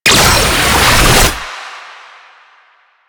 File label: Gunbrella Alt Charge Up, Weapons; Warframe; Tenno; Koumei